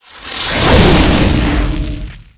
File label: Rhinoce Lord skill